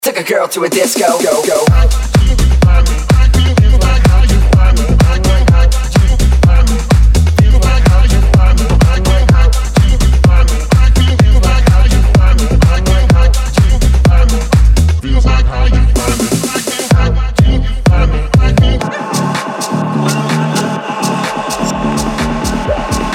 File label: EDM Medley